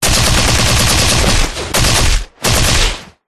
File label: warfare machine gun powerful burst designed